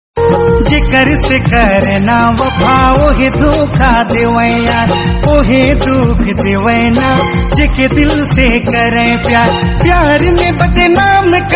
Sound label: New Song Ringtone